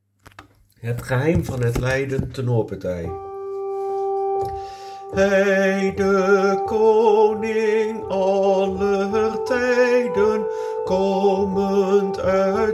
Het geheim van het lijden tenor

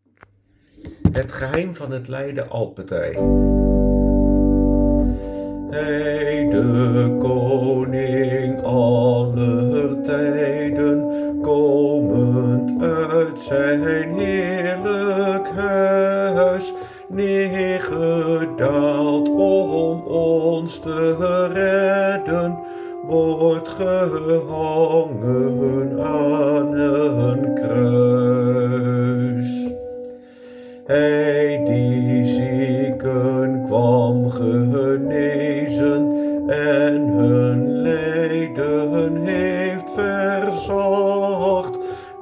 Het geheim van het lijden alt

